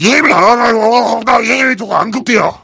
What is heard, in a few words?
heavy laugh long